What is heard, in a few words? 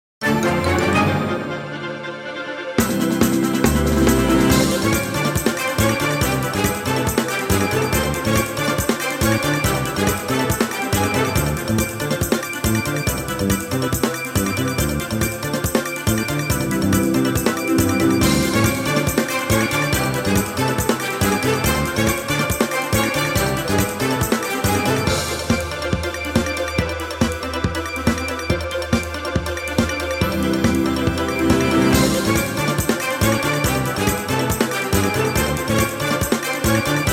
Orchestral Arrangement; Super Mario 64